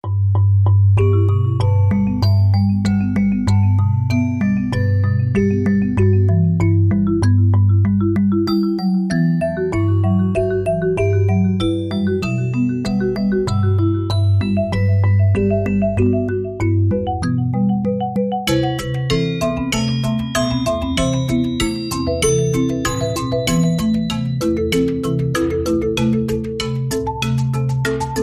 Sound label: Mechanical Marimba